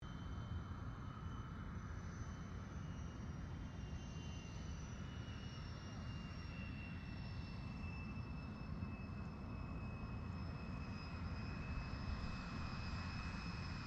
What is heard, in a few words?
AIRCRAFT GLOSTER METEOR F MK8 TAXI SHUTDOWN, Gloster Meteor fighter jet; Airplanes; Vehicles